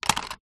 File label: leisure toy walkie talkie plastic down on surface; zapsplat